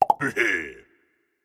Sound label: cable; LG; F520L-AKA; Soul